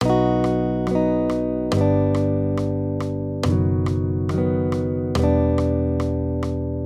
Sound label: maj triads